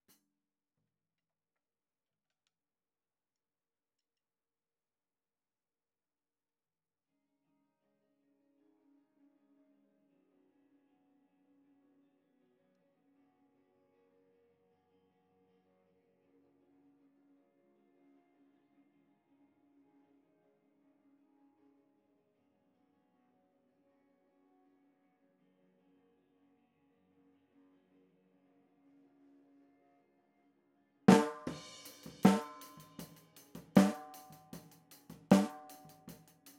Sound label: snare